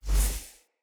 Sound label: Casting Device Block Loop Start; Weapons; Player